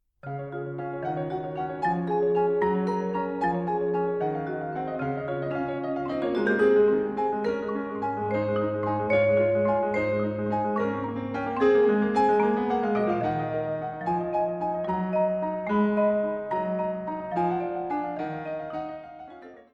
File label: Praeludium Cis Dur